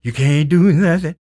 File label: SING, Blues Soul; Spectrasonics Vocal Planet